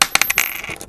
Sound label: coin